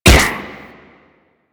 Shot; Warframe; Weapons; Corpus; UMP; Prisma

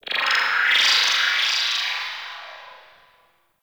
Ship Data Chirps; Warframe; Corpus Ship Remaster

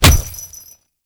Punch Impact; Warframe; Grineer; Royal Jester; Attack

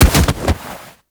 Hoverboard Ragdoll Bodyfall; Warframe; Vehicles; Venus; Hit Effects

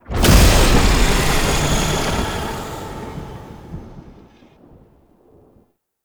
Mirror Defense Rune Despawn; Warframe; Entrati

